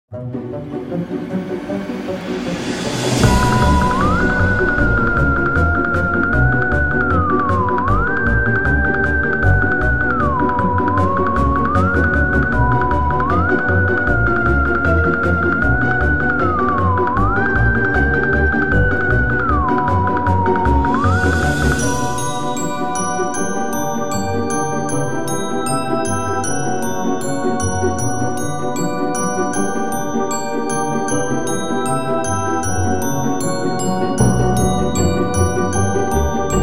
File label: Halloween Chase